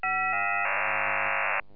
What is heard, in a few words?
new qpsk